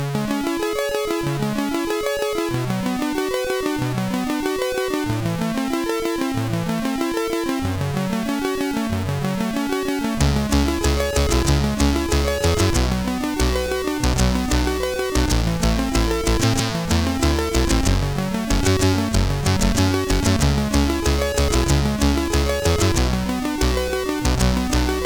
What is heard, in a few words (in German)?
Gitarre, CSG8580R5; sid